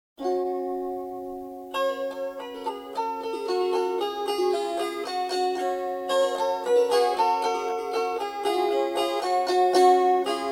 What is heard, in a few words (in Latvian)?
dansku polka